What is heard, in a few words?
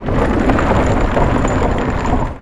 Scrape Wood Cabinet Slide; Doors